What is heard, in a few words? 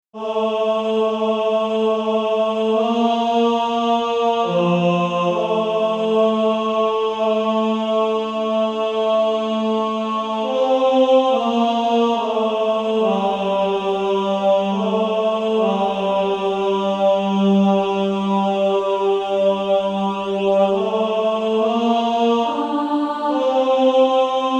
Male Choir